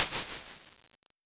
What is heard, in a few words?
greek echo hall; IR